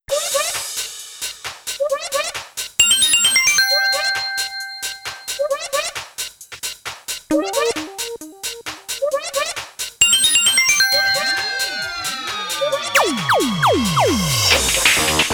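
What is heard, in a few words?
background music; Street Fighter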